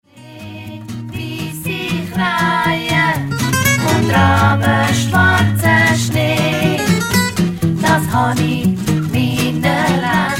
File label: swing